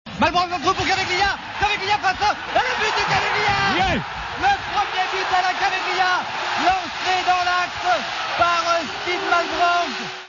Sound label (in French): vocale son but